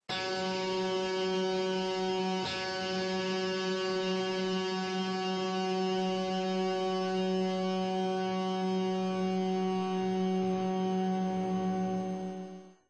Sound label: heavy metal tune up 3rd, guitar